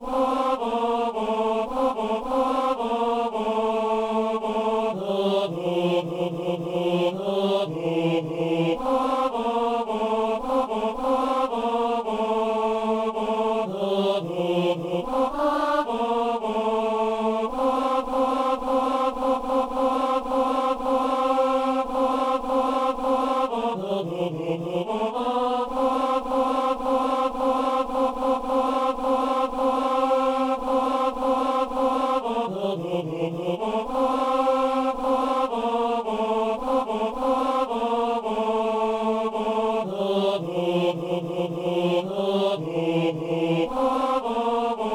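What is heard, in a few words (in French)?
ténor; il est né le divin enfant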